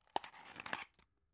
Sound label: drug open soft; arzt